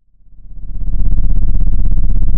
pop, Sound of an engine revving

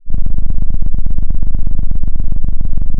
pop, Sound of a cat purring

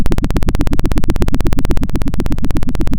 Sound of a helicopter

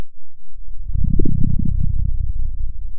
Sound of a dinosaur

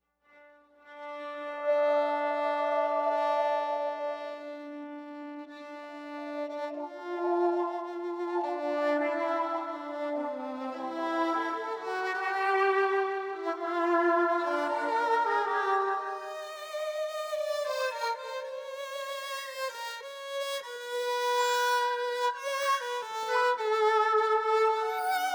hyperviolin

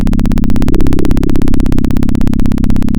Sound of a plane taking off

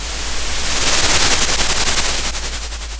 Sound of waves crashing on a shore

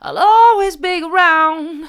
i'll allways be around soft, vocal

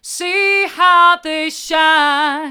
hard, vocal

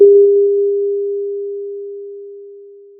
Sound of a church bell

